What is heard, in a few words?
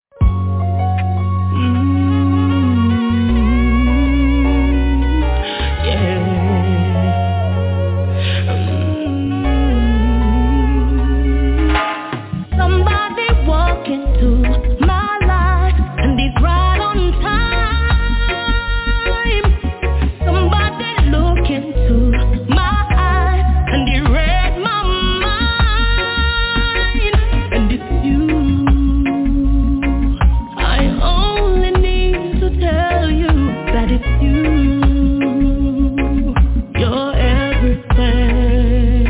socially conscious reggae music; reggae wednesdayz